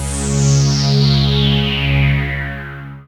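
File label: synthesizer Dance; Best Service Gigapack 1; SYN 06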